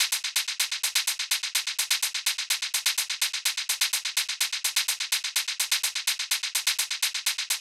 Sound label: Hat after